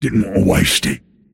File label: deny pain; pudge